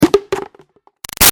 Impact Cone Plastic Small Messy; Materials; Hollow; Big Room Sound

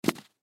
Flip Flops Set Down, Flip Flops Set Down Carpet; Shoes; Foley; Objects; Blastwave

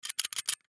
Combination Lock Locked Move Bar Up Down Multiple; Locks; Foley; SoundEffects-Blastwave

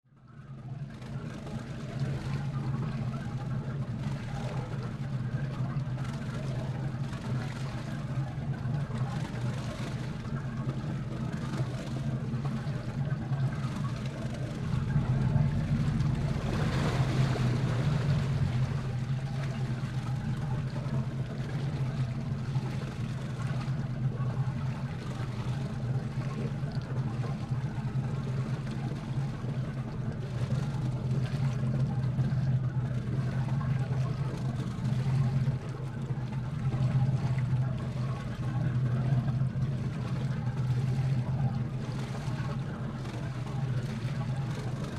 Boat Pontoon Idle; Transportation Watercraft; Boat Sound Effects; Boats (Sound Effects:Vehicles); Blastwave

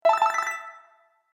Bright Sound Video Game

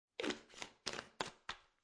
Pill Bottle Large Close Cap

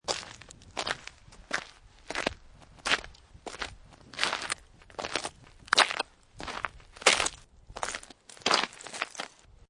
Snow And Ice Footsteps Ice; Weather

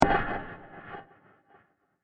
Heavy Impact With Delays; Burst; Impacts; Crafted Signals

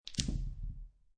Dice Throw Two Dice On Felt; Dice Sound Effects; Dice (Sound Effects:Casinos)

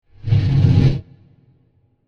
Creepy Horror Scratch Transition; Scary Soundscapes (Sound Effects:Horror)

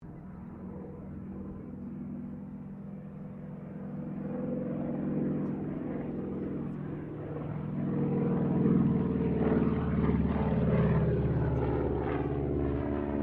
AIRCRAFT CAC CA-25 WINJEEL PASS, fighter trainer; RAAF Winjeel; Pass-Distant; Airplanes

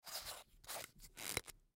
Make Up Lid On, Make Up Screw Lid On; foley; Lid Sound Effects; Objects; Blastwave

